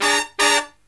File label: SLEEP, Killer Brass Riffs